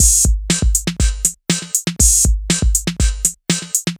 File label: Funk Gas Beat; Sonic Foundry (Sony Creative Software); Myths of Technology; Composite Beats